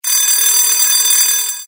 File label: bell